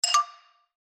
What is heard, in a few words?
notification header